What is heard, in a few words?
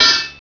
BLADE